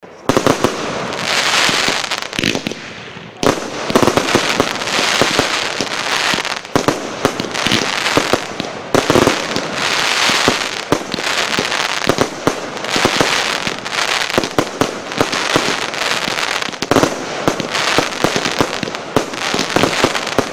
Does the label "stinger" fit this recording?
No